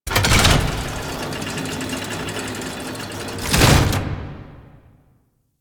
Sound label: Bridge Extend; Entrati Labs; Loid